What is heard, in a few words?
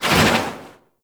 Operator Focus Dash Wave; Warframe; Operators; Tactic